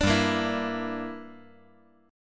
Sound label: G Minor 6th